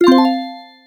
Magic Harvest; The Princess and the Frog; wii windows; Hub Swamp; Magic Harvest Magic Add

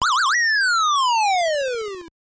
Opponent Knocked Out

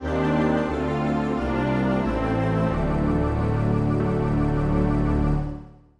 (Mono); Metroid Zero Mission Remastered

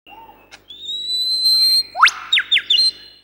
jungle; The Wild Thornberrys Movie

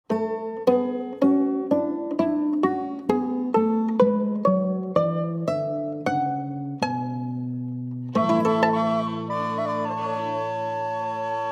End Stinger

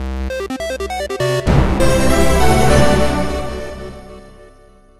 Logo Music

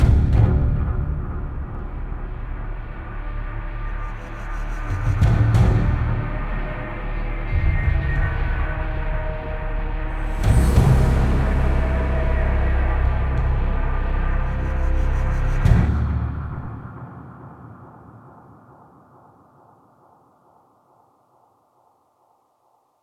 Fail; The Dark Knight Rises; Android, iOS

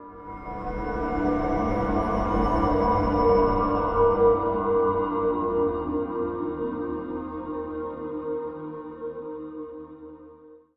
Player Spawn Story Item; ascendance

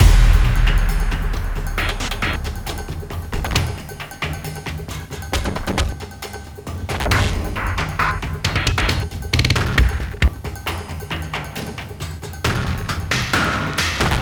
Desert Small Fight; sky break

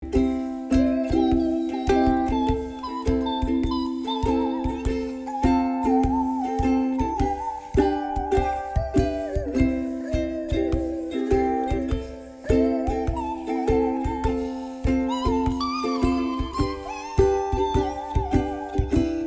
Uke Vox; Beefense BeeMastered

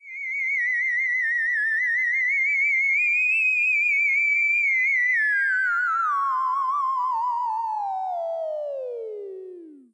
Theremin Quieter Stop Time

